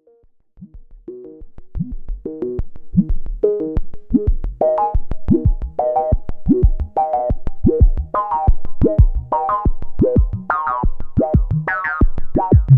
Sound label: jup6 BPF sequ